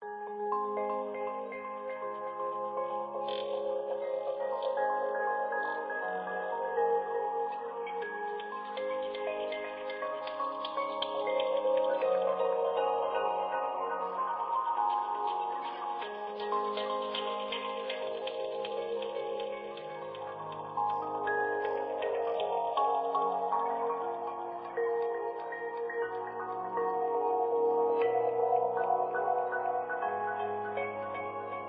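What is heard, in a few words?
Music Ambient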